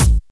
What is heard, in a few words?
clean kick drum one shot A key; kick drum samples